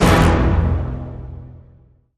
Hit Orch Tutti E

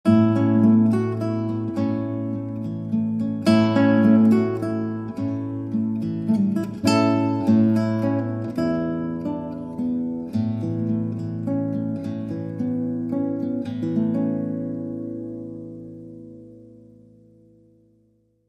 Solo Guitar

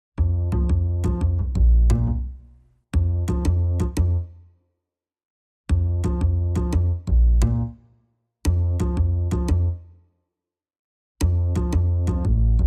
Drums and Bass

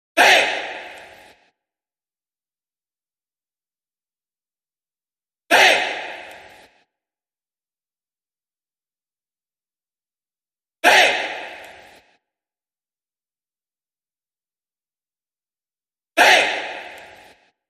Vox

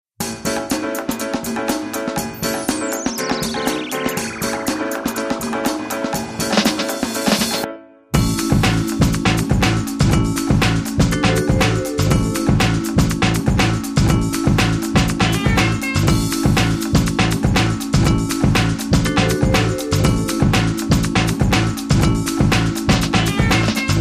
No Vocal Shouts No Scratching Mix

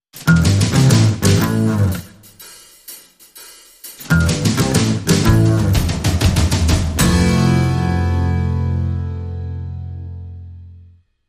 Shuffle

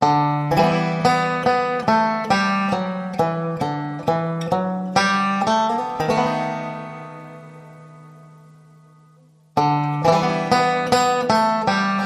Banjo

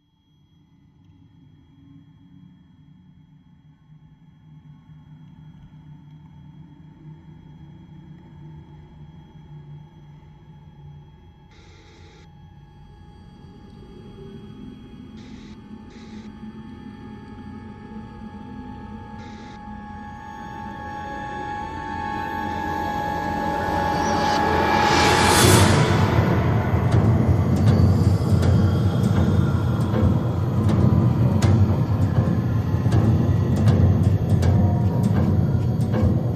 Pads and Small Perc

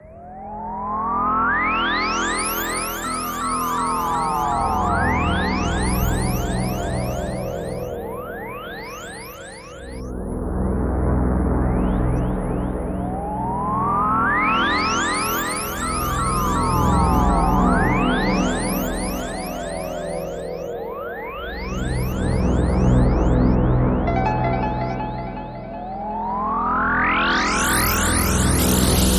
Spaceflute

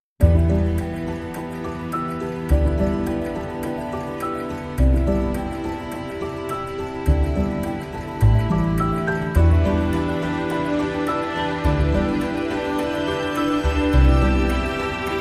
Broken Clock

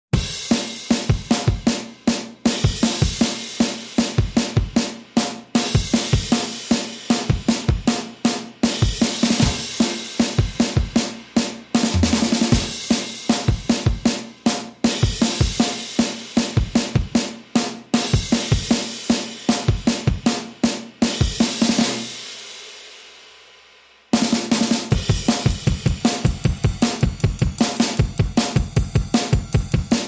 Drums Only

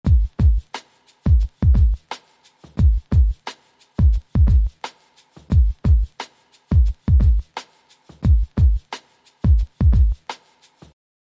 Jazzy, Drum Loop

